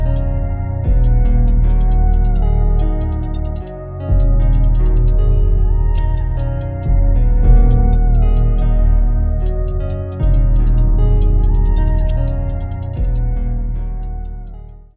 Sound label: chill